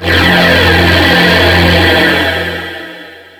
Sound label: black energy